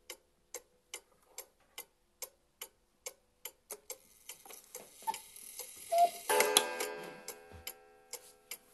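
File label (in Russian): часы с кукушкой 1 час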